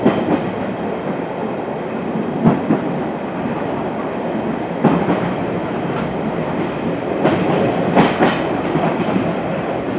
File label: Run, Train; OpenBVE